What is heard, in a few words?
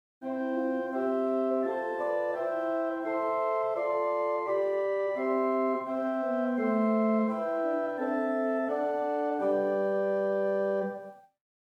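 Swell Gemshorn 4, organ; First Baptist Riverside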